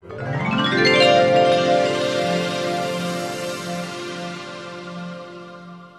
CARRILLON DE CAMPANILLAS; tono movil; SONIDOS WHATSAPP

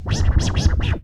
sonido scratch

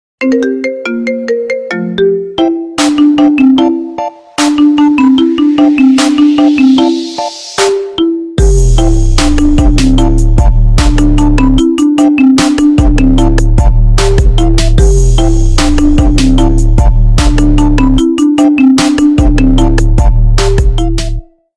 tono ring clasico

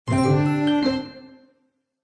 tu tu tu tun llegada de mensaje a tu telefono movil; SONIDOS WHATSAPP

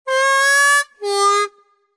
wha wha tono para movil sonido celular; SONIDOS WHATSAPP

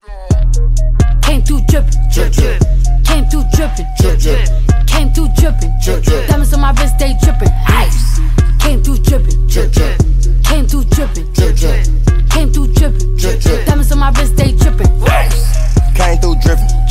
Drip tono

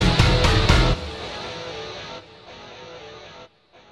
tu tu ta chiin, tono para tu celular; SONIDOS WHATSAPP

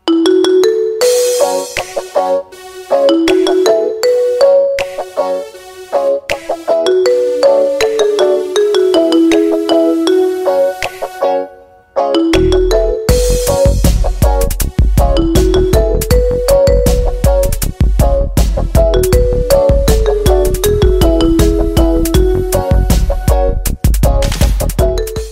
sonido submarino inmersion

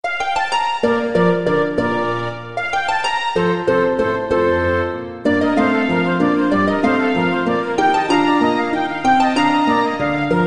Tonos de llamada con volumen alto

